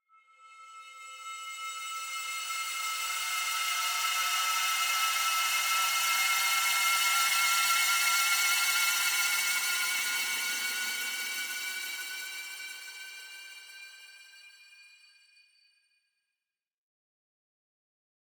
shimmer, metal crystal ascending; boom library; Anime Essentials; DSGNMisc

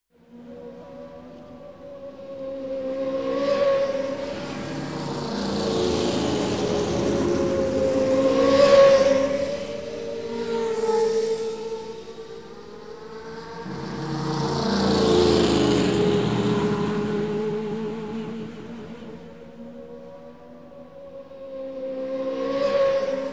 Race Cars Passing; KMP Film Video Sound Effects

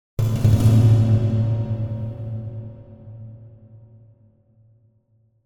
Metal Drum - Several Hits; Hollywood Edge; sound designer's toolkit v2

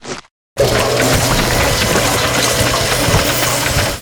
Cartoon Teddy Bear Blender; SFX Kit; Foley - Misc

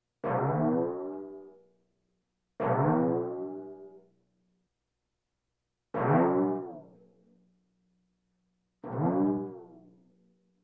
Percussion Bongos Tympani rolls Gongs Drums

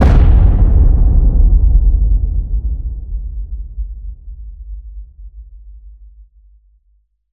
HIT Boom, Cinematic Hits Designed

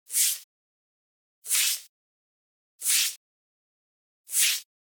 Generic Slide; boom library; The Interface

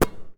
Basketball Catch

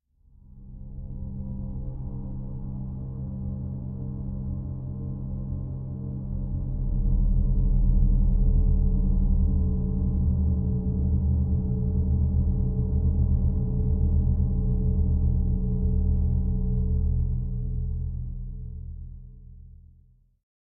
Drone Low End Sub Pad - Suspense; Hollywood Edge; sound designer's toolkit v2

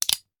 Hand Gun Foley; Sound Ideas; Guns

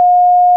lock loop; Space Wave Race

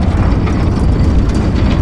Door Large Stone Loop; Doors

